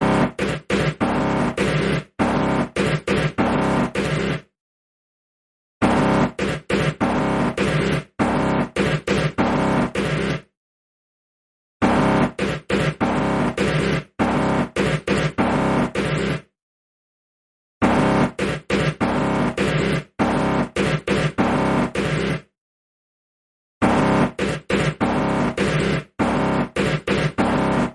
iPhone Broken Robot

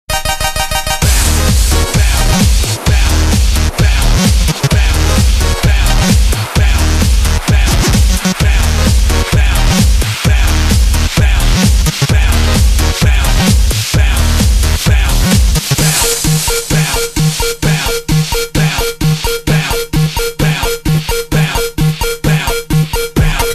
Dance 8 Bit Mix

